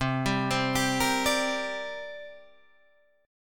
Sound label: C 7th Suspended 2nd C down Guitar Open D